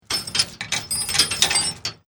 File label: Chain Metal Clanging; Metal Sound Effects; LYN103 package of Chain Sounds; Lynne Music